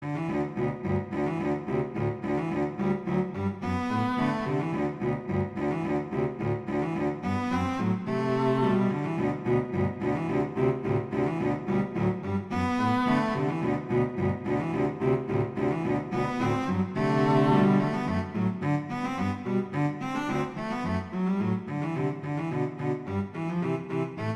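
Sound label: Duet, Rondo Allegretto, viola; Mazas; Duets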